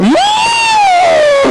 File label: wrrr